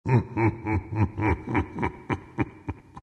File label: laugh